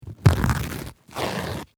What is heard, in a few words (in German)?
bad toilettenpapier